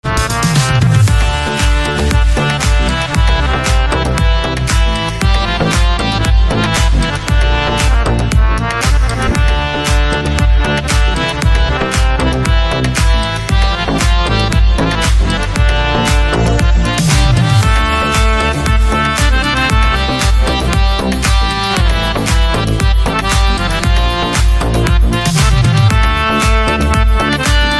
Harmonium Instrumental Ringtone

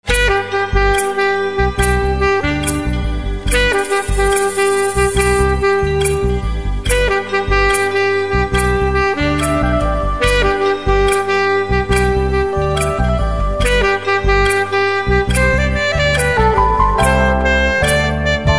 Saxophone

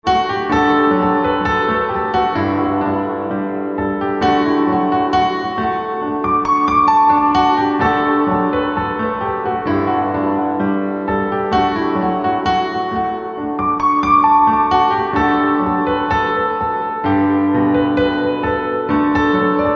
Piano Slow; instrumental ringtones

